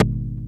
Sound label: Tom Tone Smooth; Lost Tapes From Mars